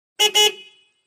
Montero Horn 2 Beeps, 1998 mitsubishi montero ext.